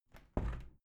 window plastic squeak open soft

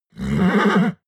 horse vocal neigh

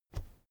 keyboard external space push soft

